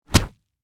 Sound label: Impact Punch Body, Impact, Punch, Body, Hard; Punches and Hits; Combat; Blast Wave